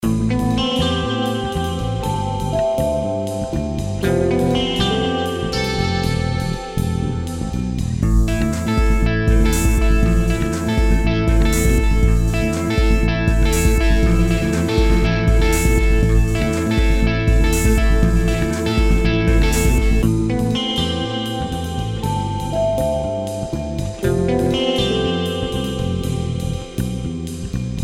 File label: Guitar Smooth